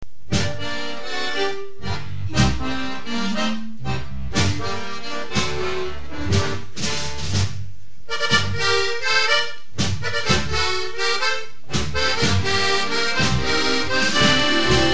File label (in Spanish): Tango de la playa